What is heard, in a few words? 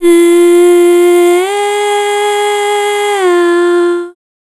Dance Vocals, FEMALE